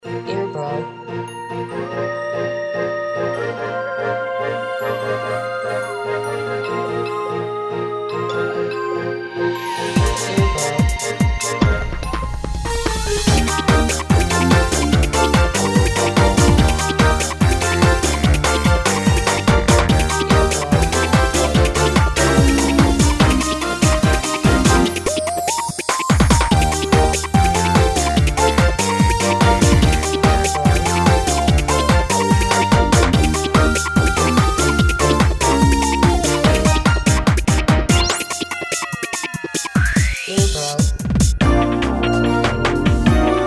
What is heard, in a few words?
Casual Shoes, loop